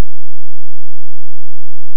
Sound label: science high roll bass loop